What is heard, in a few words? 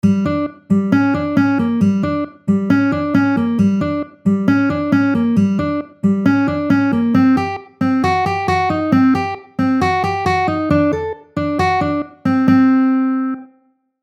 riff